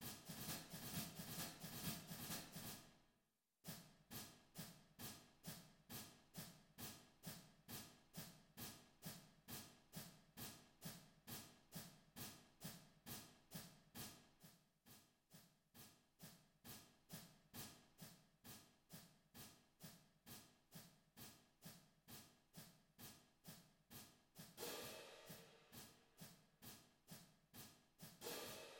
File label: cymbals